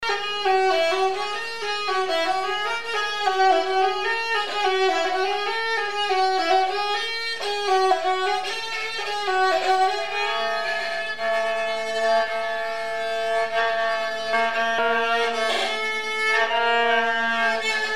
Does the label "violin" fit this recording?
Yes